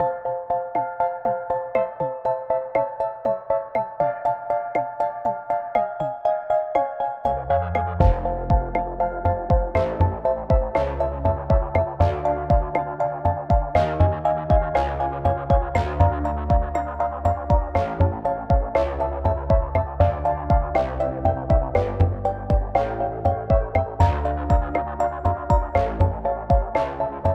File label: Instrumental